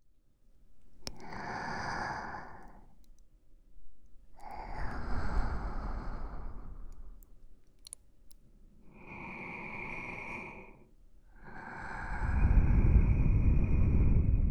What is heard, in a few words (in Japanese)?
両耳 寝息×クチュクチュ（げっぷ抜き）